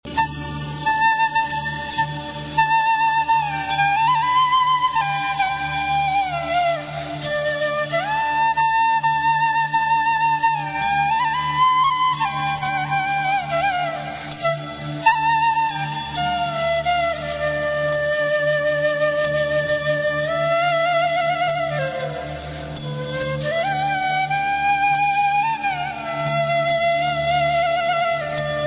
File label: best flute ever ringtone